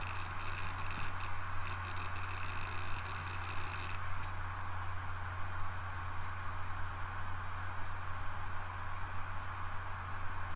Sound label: laptop; Original TK Sounds